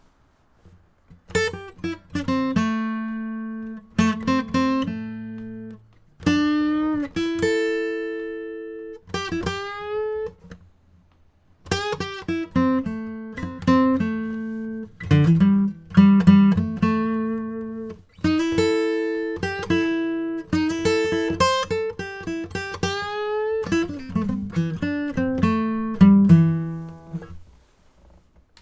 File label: lead no rhythm; A pentatonic lead guitar from above with no rhythm